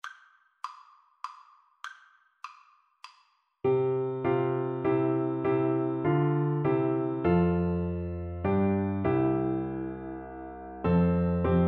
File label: Oboe